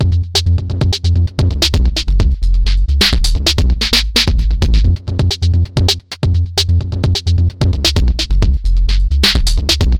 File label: break